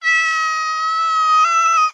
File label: cat cry